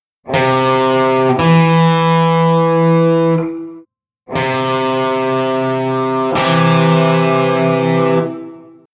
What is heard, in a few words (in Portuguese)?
Intervalo de Terca Maior, guitar